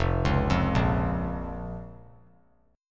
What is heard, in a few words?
F Suspended 4th Sharp 5th F; arpeggiated; 5,8,8,8; JGuitar